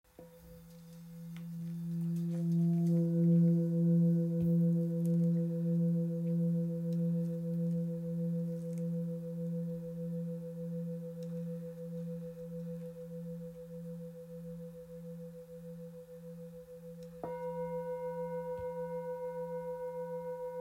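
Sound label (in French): Bol full moon fa, bols chantants tibétains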